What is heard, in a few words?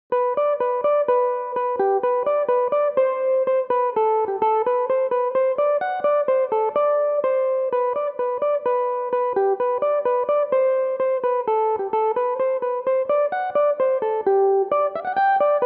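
G major, irish mandolin, tunes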